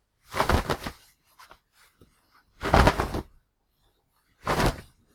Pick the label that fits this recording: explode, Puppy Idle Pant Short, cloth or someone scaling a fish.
cloth